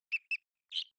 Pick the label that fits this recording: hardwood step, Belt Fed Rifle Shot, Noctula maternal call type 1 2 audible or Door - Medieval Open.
Noctula maternal call type 1 2 audible